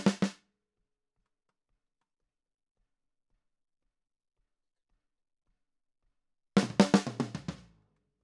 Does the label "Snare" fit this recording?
Yes